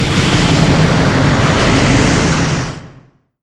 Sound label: incinerate